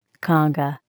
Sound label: conga